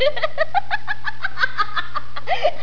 LAUGHIN

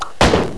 BOWL

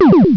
ALIENS

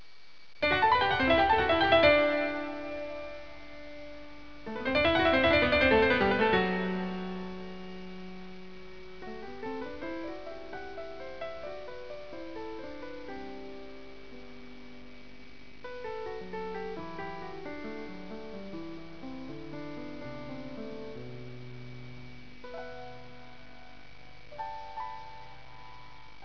Windows Start

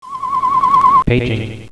whistle

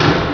TRAPDOOR

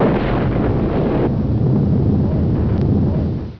BOOM

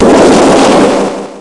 FLAME

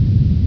thrust

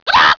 GOBBLE

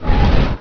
DOOR

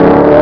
REV

